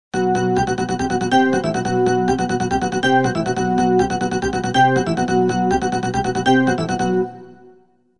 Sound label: Analogsmell